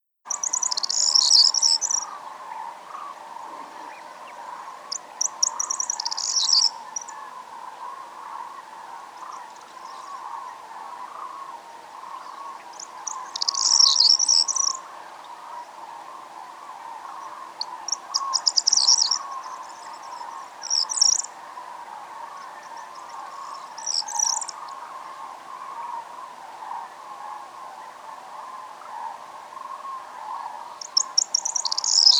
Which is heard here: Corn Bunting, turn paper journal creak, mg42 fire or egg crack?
Corn Bunting